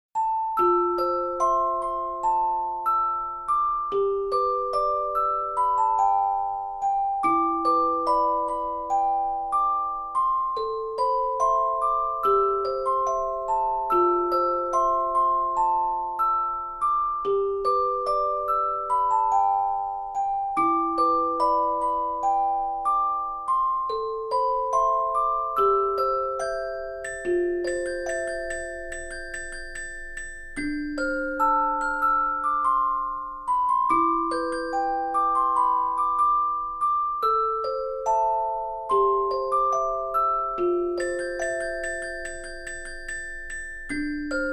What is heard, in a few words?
Orgel, Music Box; SailorMoon